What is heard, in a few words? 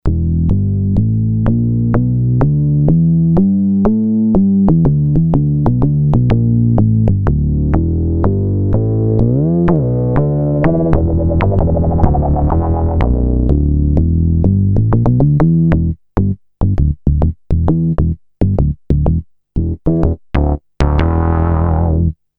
fonk bass